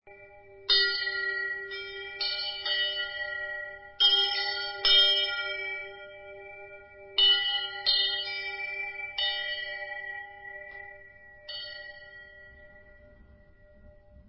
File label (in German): glocke